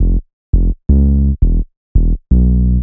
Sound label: Bass, Futurist Drum & Bass